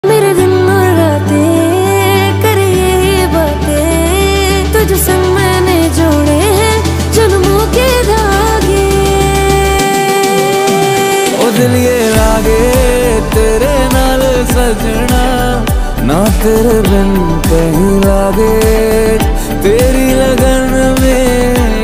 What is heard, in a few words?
hindi ringtone